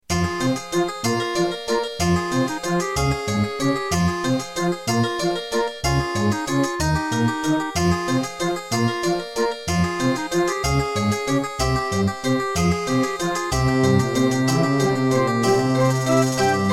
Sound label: Track 6, Casio GZ-50M; Death Gate